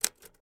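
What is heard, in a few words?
Typewriter Single Key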